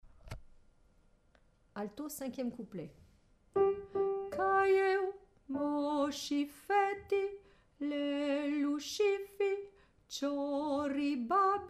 Alto

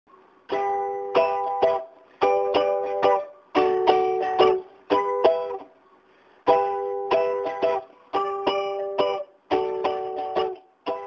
chorus, ukulele

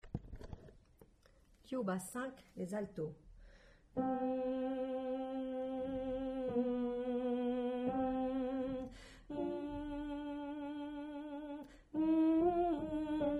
Alto

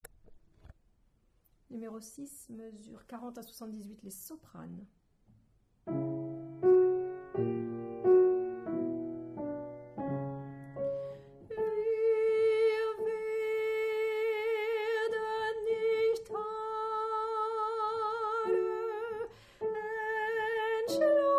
Soprano

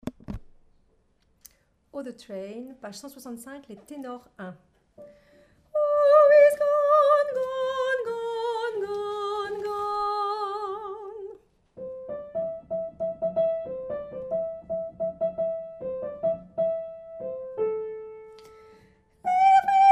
Tenor

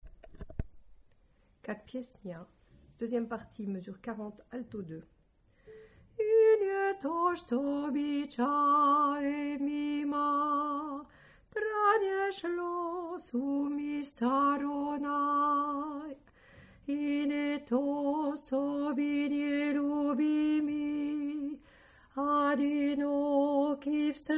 Alto

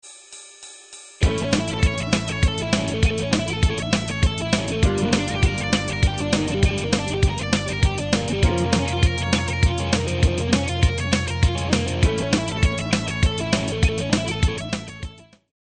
sweep 200

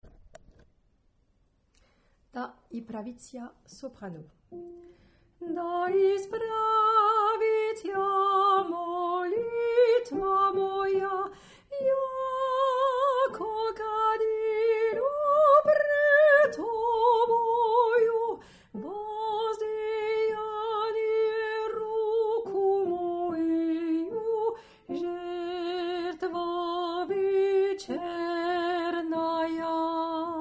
Soprano; seconde chanson russe

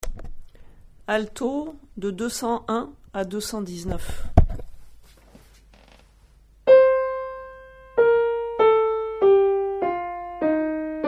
alto Piano; libera me

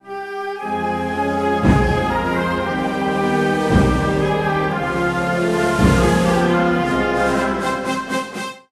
Marseillaise instrumental phrase

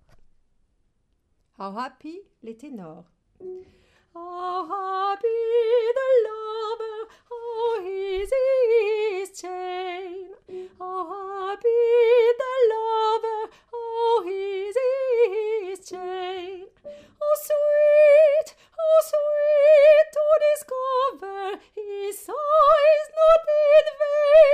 Tenor

